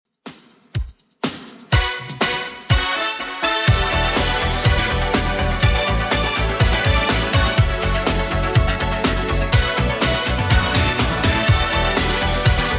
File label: 80s Redrum, 123 bpm